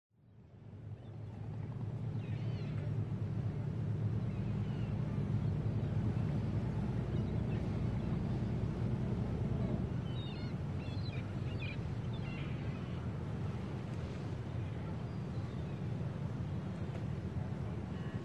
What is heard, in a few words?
istanbul mix, soundscape; clock